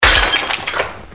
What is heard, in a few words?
bottle